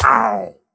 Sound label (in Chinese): 受伤声; 掠夺者